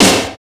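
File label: TECH 140 snare drum, Big Beat Drumloops; AKAI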